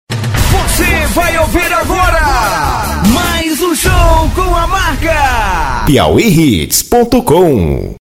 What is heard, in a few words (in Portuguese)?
forro